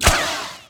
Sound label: light hit, saber